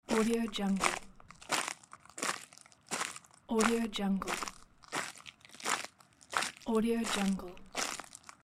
crunchy footsteps

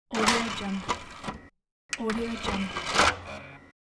cd player open close; beishome

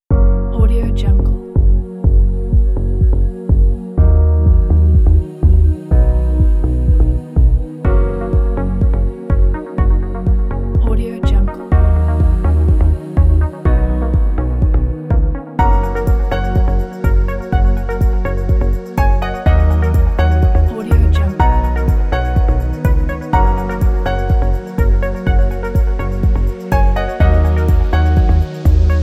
running time corporate

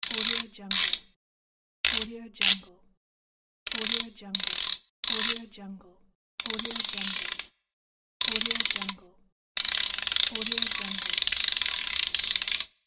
key ratchet

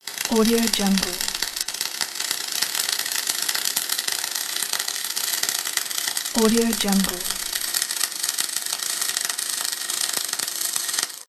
welding

